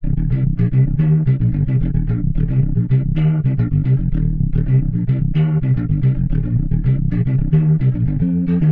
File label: 110 C Wide Runner, Warped Bass; Loops; Touch Loops; CosmicFunk